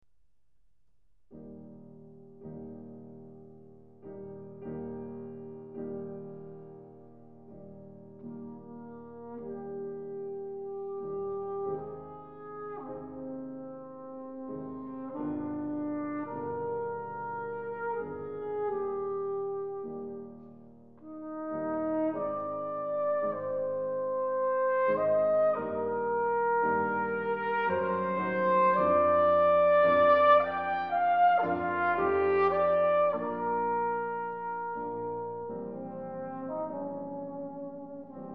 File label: Trio for trumpet trombone and piano